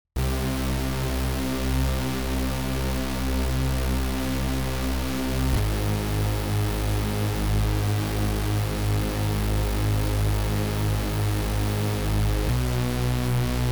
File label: bass synth